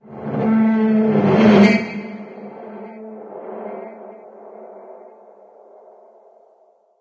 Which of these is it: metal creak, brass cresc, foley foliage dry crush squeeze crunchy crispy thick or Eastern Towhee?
metal creak